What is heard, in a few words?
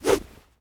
machete attack; Rust